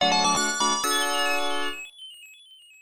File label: Win; Five Nights at Sonic's Maniac Mania